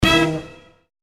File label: Wrong Answer; Whodunnit